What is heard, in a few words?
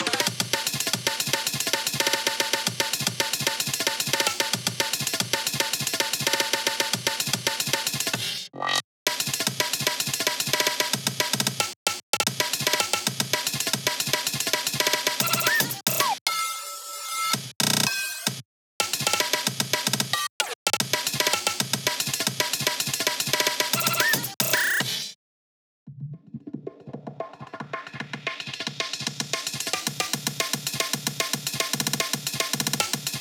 estrogen induced brainrot break